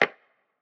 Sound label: Mini Perc; Percussion; Lofi